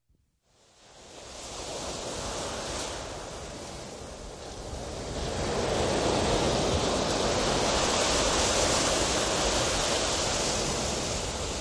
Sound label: Ocean Surf; Sleep Sounds of Nature